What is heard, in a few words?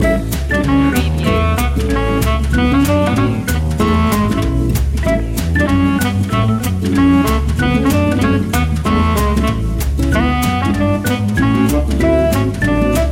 deck the halls jazz